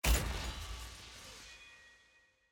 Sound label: sfx ui collection artifact click; jayce